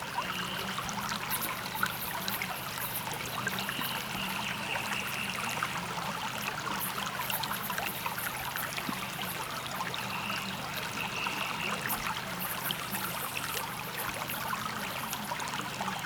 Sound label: Misty Gorge Nature; Phoenotopia Awakening